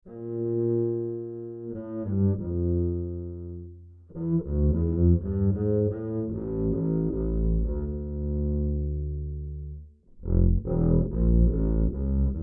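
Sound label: acoustic bass Bowed direct input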